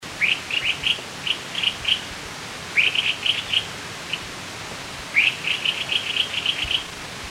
Streak breasted Scimitar Babbler, birdcall

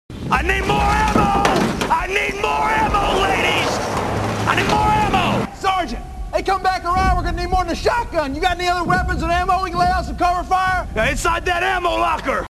ammo

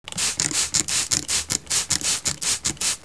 spray; casa; objetos

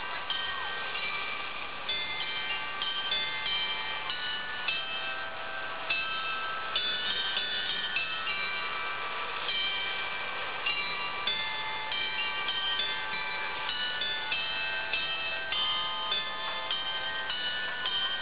bells

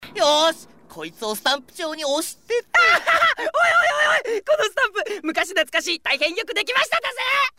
Laugh funny

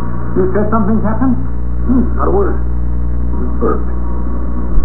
Burped

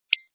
Raindrop, Jupi-8

